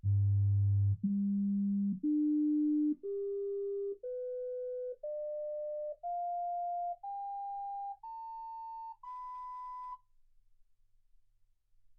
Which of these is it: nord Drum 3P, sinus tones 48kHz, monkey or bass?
sinus tones 48kHz